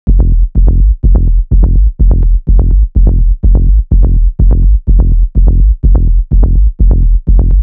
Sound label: Bassline 125 F; Loops; Darktime